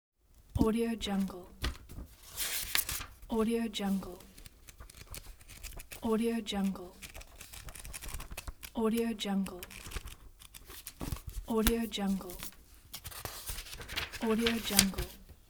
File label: scrolling open book turn pages; mehi production